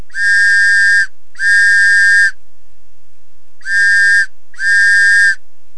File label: ENG WHIST, Bruitages